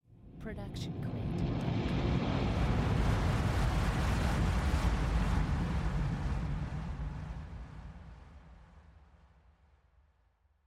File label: Noise Lifter; soundscrate